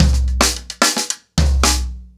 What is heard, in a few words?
Crash 110BPM, ACOUSTIC DRUMS